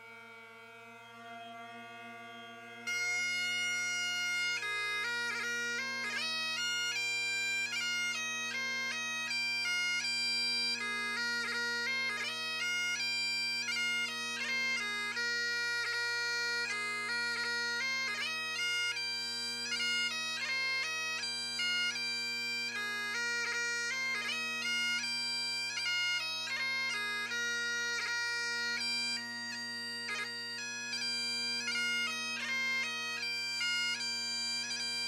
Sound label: Barren Rocks, bagpipes; Mairis Wedding